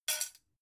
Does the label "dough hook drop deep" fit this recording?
Yes